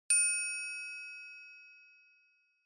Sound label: Triangle Single Short